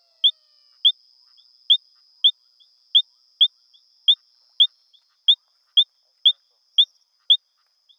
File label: Leptodactylus latinasus; herpetologia; cantos